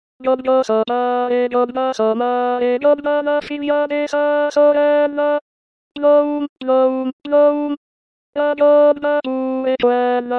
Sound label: alto